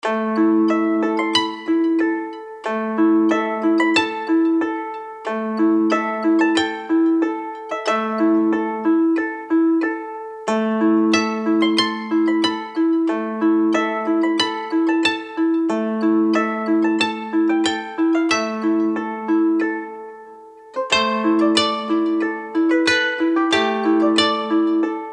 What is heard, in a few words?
dramatic koto